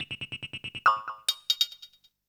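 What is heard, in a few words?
PERCUSSION ELECTRO 140, Techno Drum; Techno2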